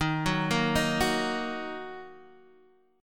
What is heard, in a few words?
Eb Minor, Guitar, Open D